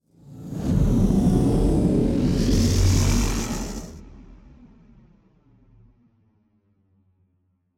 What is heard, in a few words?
Sound Design Mech SFX